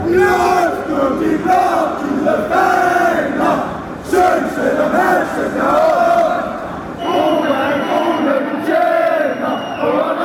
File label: copenhagen chant, fanchants